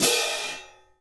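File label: cymbal, Orchestral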